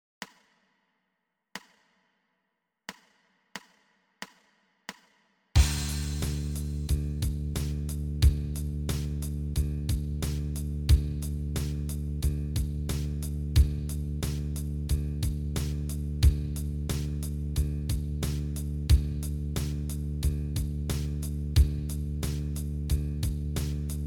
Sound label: bass startup open d 90